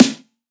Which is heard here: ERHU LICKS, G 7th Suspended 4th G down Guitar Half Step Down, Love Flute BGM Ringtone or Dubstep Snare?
Dubstep Snare